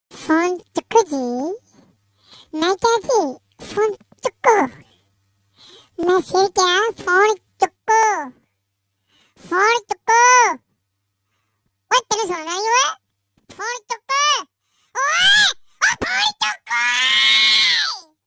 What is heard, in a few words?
very funny baby